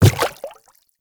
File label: Infested Footsteps Quadruped Medium Walk Water; Warframe